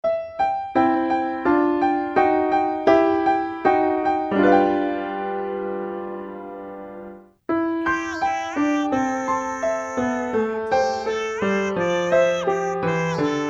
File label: male